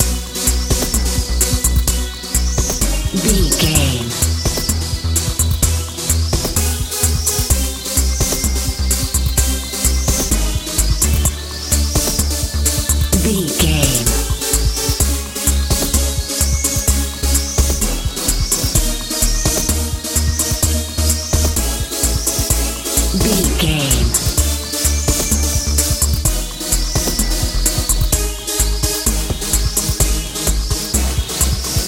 fun nice rice grains life techno

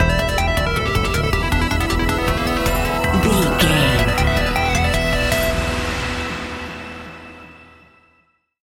epic video game adventure stinger

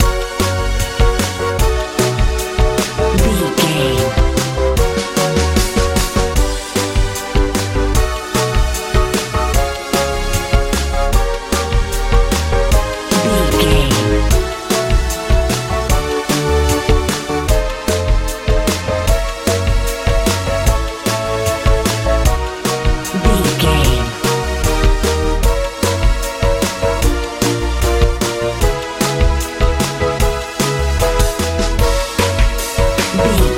nice blooming pep squad pop

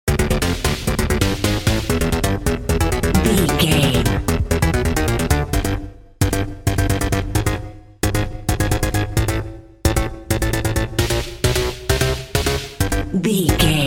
intense video game level bumper

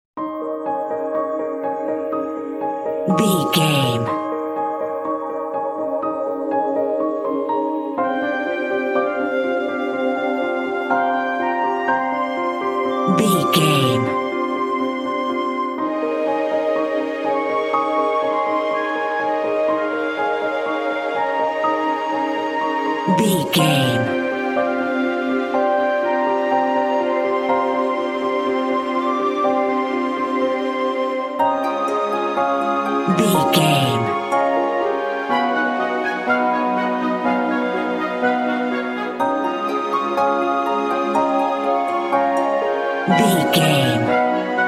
enchanted magical forest

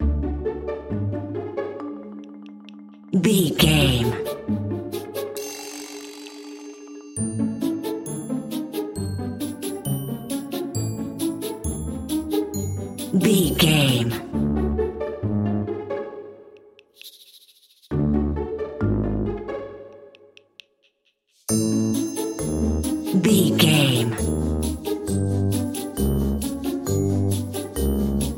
stealthy tiptoeing

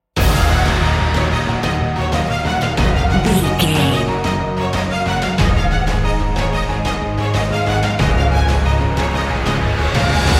epic cinematic

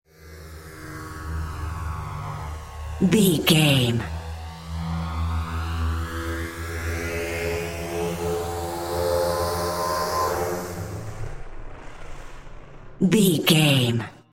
ominous war plane engine stalled heard courtyard

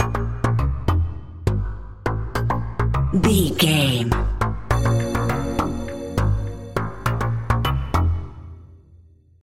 mysterious task video game loop

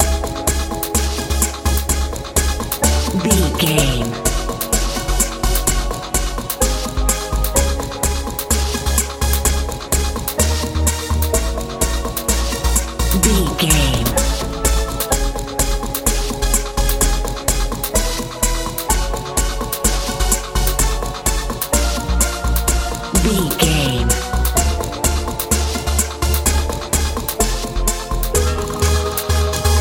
colorful warm rice grains dreams modern dance

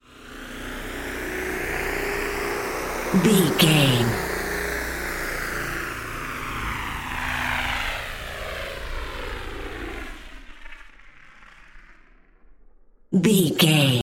ominous war plane

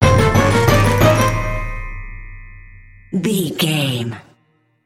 upside down inside out sting

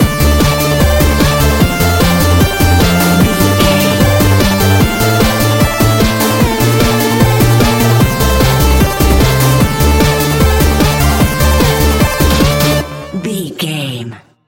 retro 8 bit game stinger

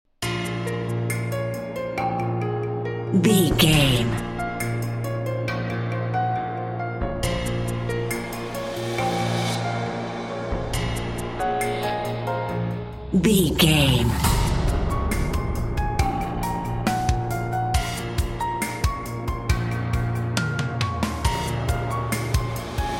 sinister foreshadowing cutdown

